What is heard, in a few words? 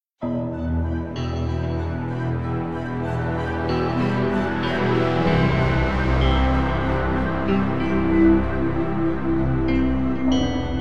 Music Sad Ominous No Lead; starcraft 2 wings of liberty; MissionMusic